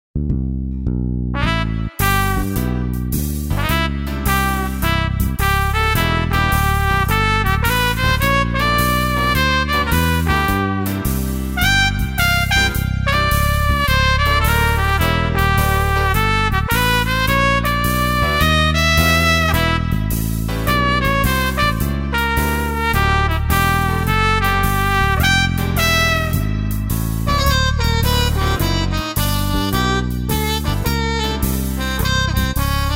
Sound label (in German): Trp, Blues